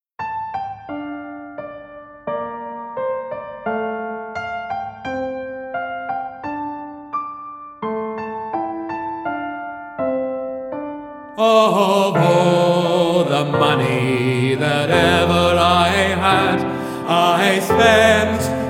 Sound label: The Parting Glass practice Baritone; men2sing